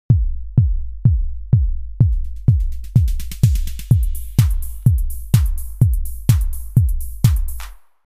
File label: kick